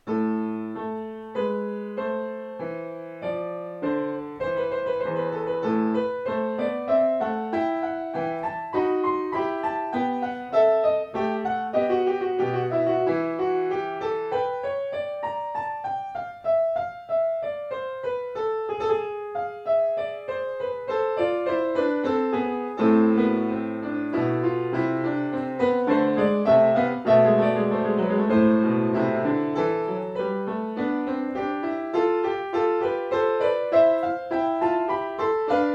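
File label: Bach Sinfonia, Yamaha Klavier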